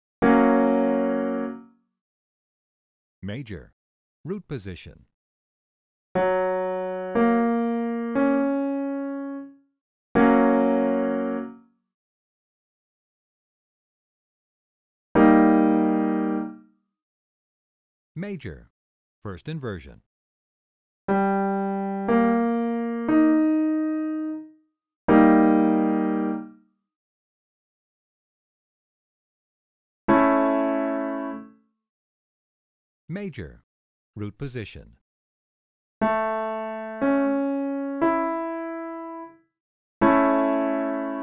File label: Major triad inversions root and first inversion; Chord Recognition: Triad Inversions; Training Exercise